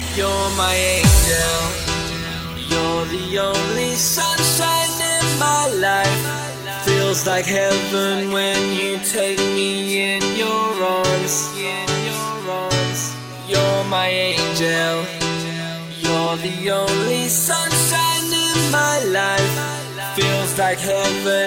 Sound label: Ringtone